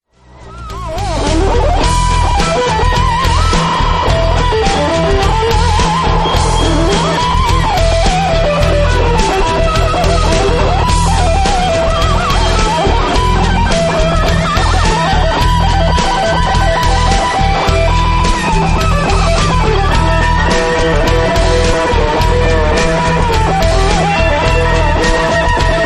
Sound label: Apocolyptic Rock